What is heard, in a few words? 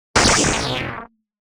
Glitchy Blast; soundscrate